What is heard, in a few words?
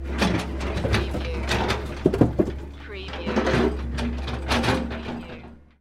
Metal and Rocks Rattle And Rumble